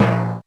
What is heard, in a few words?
TIMPN, R50III; Crypton - DrumBase5000